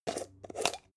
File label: jar plastic midsize open short